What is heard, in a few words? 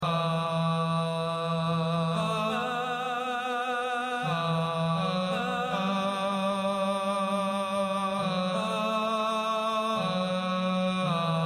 choir